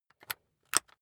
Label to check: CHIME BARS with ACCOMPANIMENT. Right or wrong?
Wrong